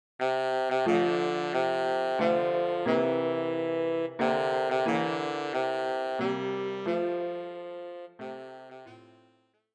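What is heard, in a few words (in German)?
Happy Birthday Tenorsaxophon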